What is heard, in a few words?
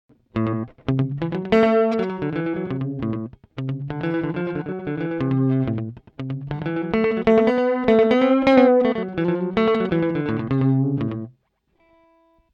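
Guitar Digital Slapback; ReTracer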